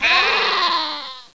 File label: sheep die; Caesar III